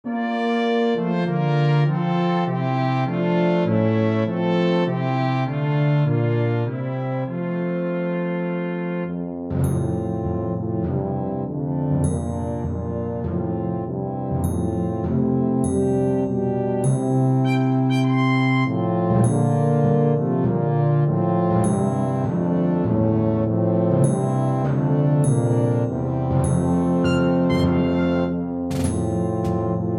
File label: Tuba Track with Melody; While Shepherds Watched